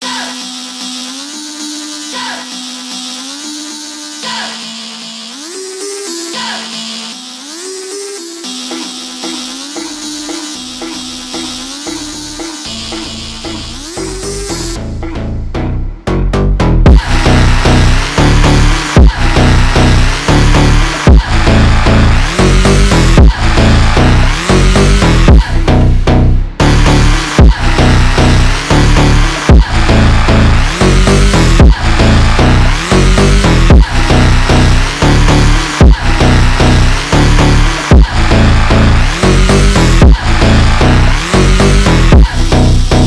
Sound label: brazil phonk